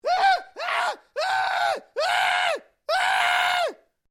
MALE SCREAM PANICED; Male Vocal sounds; Screaming

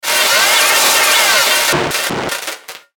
Stutter Radio Transmission

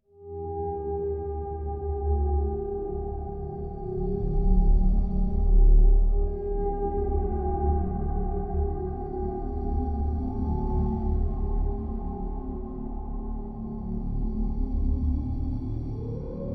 Drone Scifi Horror Underwater Tonal Whales; Sad Ominous Lonely Scary

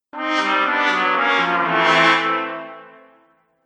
Cartoon Failure Trumpet; Cartoon Music; Twistedtracks

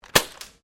GUN TOY DART PISTOL FIRING; Toy Guns (Sound Effects:Toys)

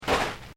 Footsteps Boots Sand Step; Footstep Foley; Footsteps (Sound Effects:Human)

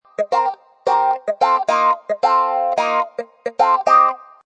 Wah Electric Guitar Riff; Funk Electric guitar loop in A# at 110bpm; Guitar Loops

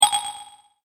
multimedia rollover, Multimedia Rollover Tone

